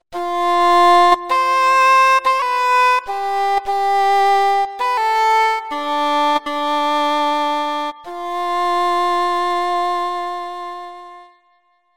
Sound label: English Horn